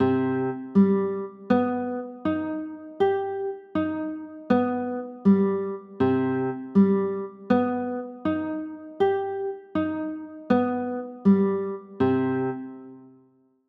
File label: c m maj7; chords